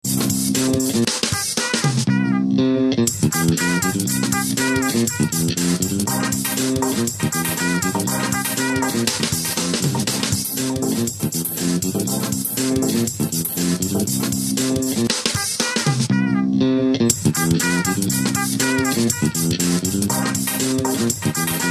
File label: Trance Ringer